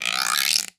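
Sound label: LARGE L-P G; Voice Crystal - Steve Reid Definitive Percussion; ERIE FILM-Q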